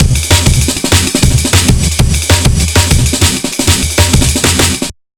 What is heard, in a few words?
196 bpm break